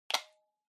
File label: Portable Cassette Earphones Unplug